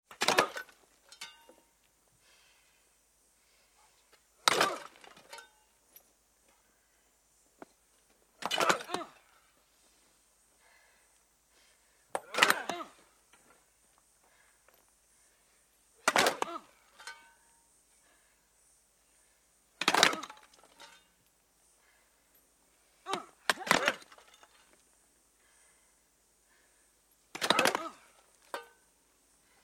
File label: Chain gang, Prisoners breaking rocks with picks and shovels - roadside gang; grunts and moans